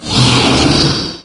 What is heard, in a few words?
ceiling attack solo, wraith; doom3